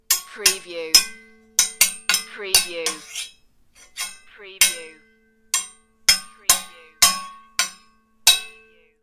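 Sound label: Toy Sword Play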